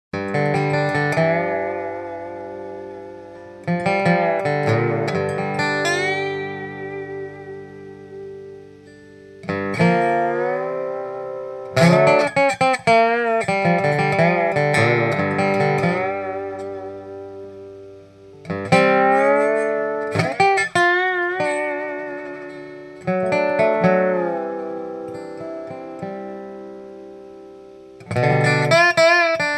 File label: Dobro Amp; Dobro Soundclip